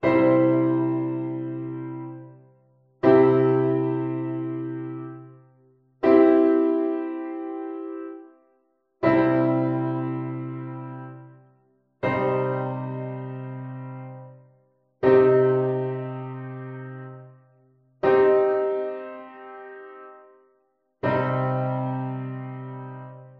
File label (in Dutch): vierstemmige zetting; verdubbeling